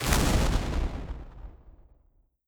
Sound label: lightning magic